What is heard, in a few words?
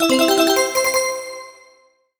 MUSIC EFFECT Bell Voice Positive (stereo); startide macos switch windows gamerip 2017